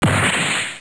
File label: fall; Pamela Anderson Interactive